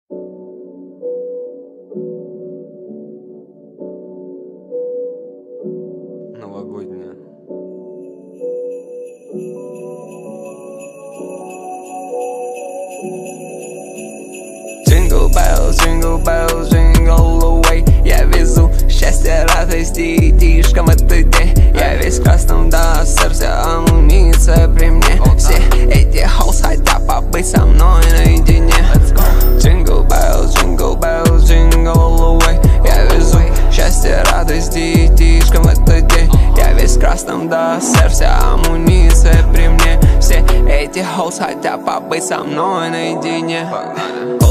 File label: jingle bells jingle bells jingle all the way